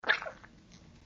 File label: slosh; Lewd SFX